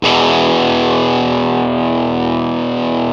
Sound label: GTR Crunch, Dirty; Club-50 - Foundations Roland